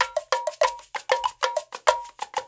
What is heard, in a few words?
NYC Percussionworks; Sampleheads; GJ LOOPS 96